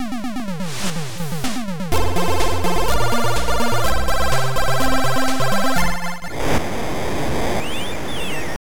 Victory Music; Kaboom!

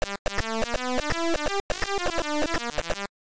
Title Beeper; Ping Pong

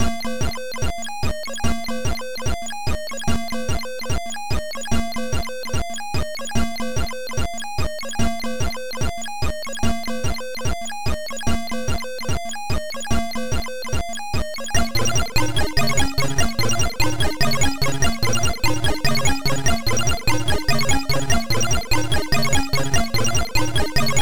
Trance party

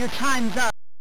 Title AY Beeper; Chase HQ

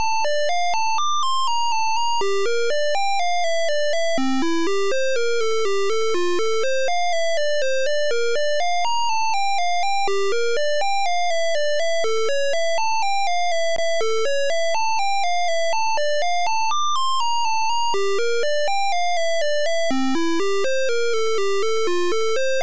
MELODY

